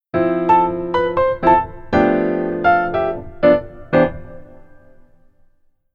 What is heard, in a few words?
Keyboard Solo Left Hand